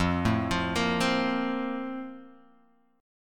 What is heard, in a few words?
F Major Add 11th F; Fadd11 arpeggiated; JGuitar